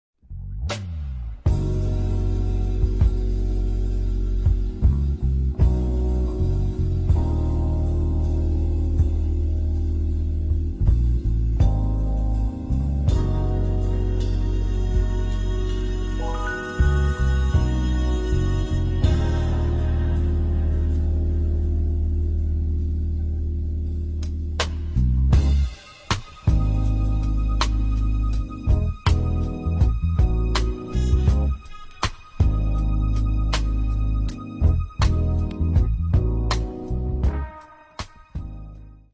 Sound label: instrumental